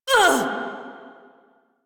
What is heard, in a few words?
Pain Lich B